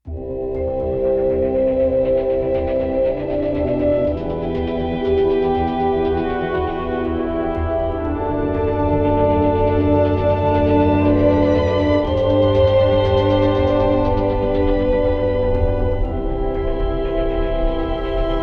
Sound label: Ambient